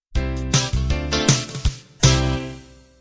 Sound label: 60s pop end